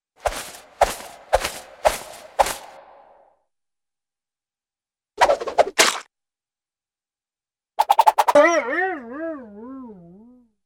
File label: Sharp Reverberant Rapid Swishes; Cartoon; Hollywood Edge; Cartoon Trax